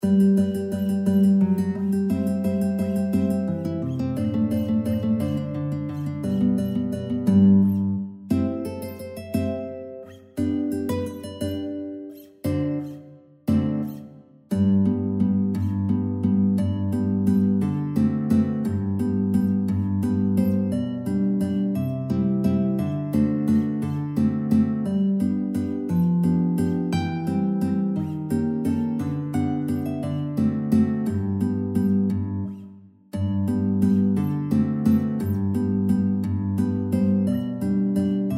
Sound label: guitar duet